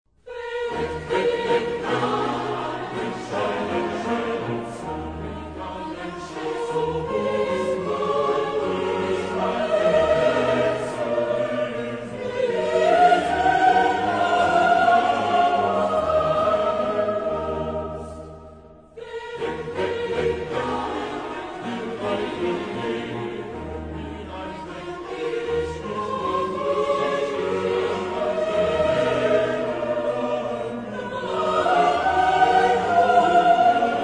Cantata, chorus; Bach; BWV227; Weg mit allen Schatzen

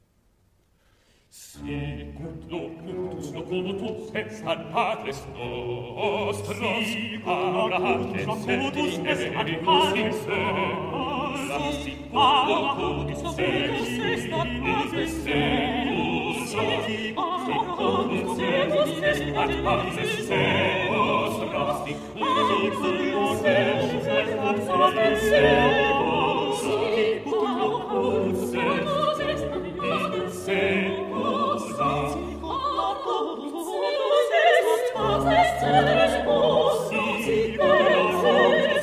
Magnificat, chorus; Bach; BWV 243; Sicut Locutus Est

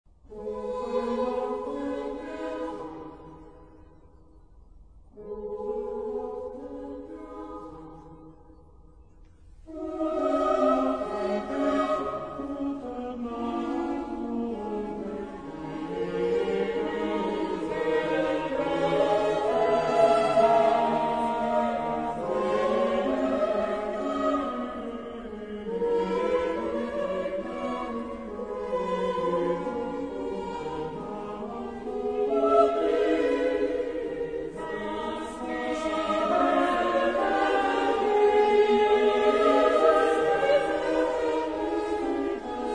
Cantata, chorus; Bach; BWV227; Gute Nacht o Wesen

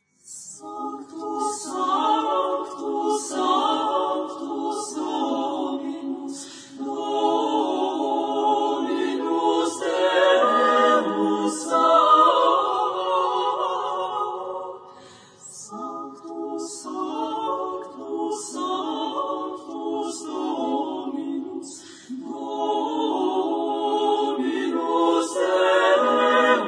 Missa Brevis Sanctus (female); Penderecki; chorus

